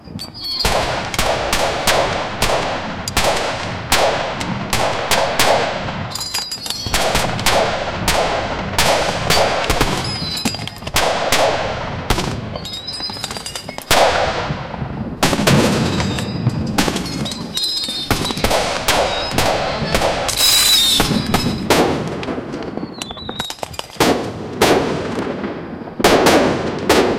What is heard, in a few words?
outside the tent the soun, arrow whistling